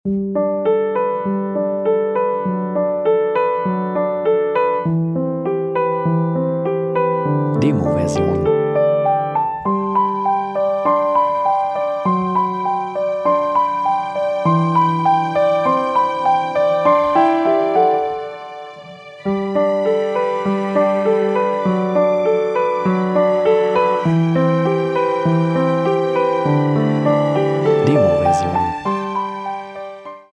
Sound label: Ballade